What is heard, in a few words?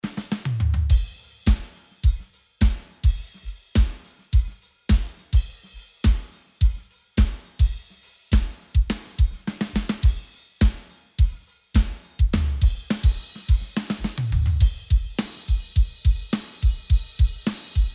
Drums; wesaudio; pultec shootout